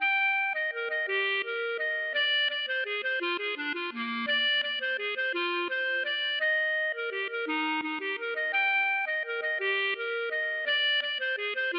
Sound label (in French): valse